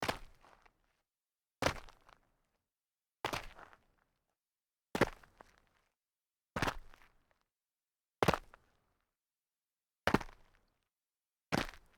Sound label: sneaker small jump light grit, foley